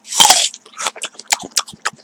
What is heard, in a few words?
Apple Bite N Chew